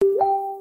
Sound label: new message chime